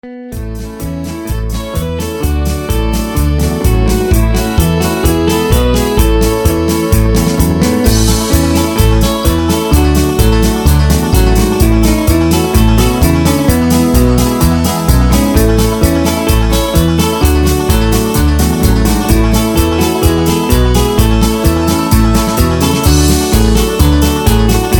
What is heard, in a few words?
Instrumental